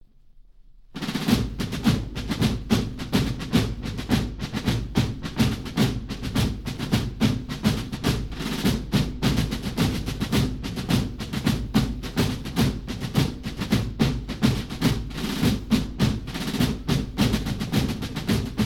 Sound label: Fife and Drum Corps